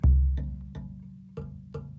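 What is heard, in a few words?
Calm Far, music; from dust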